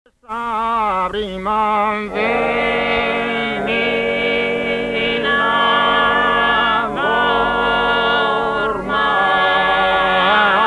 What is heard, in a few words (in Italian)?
canto a tenore; archivio rai sardegna; musica strumentale